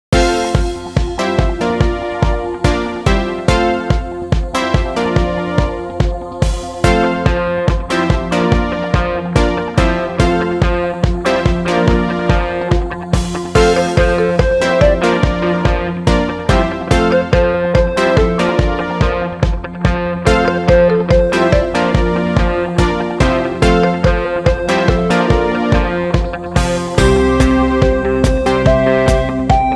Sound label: Joyful Noises